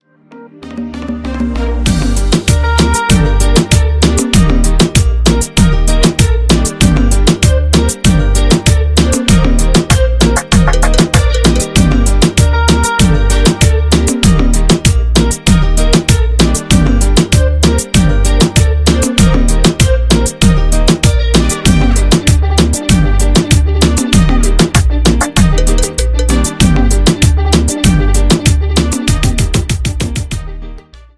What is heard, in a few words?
Rumbaton Beat